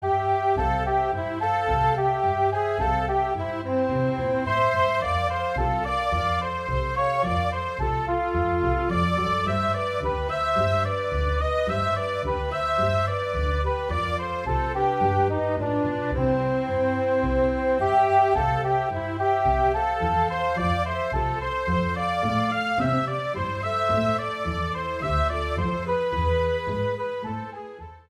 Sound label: Anglo American Reels